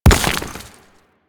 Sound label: Nechro Mech Step Surface Dirt; Step Surfaces